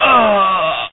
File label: male death